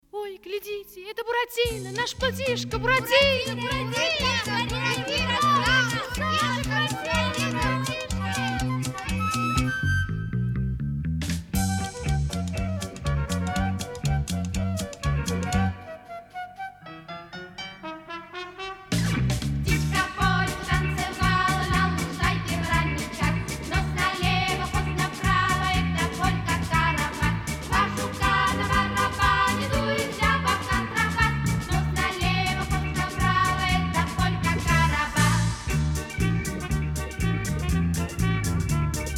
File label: Polka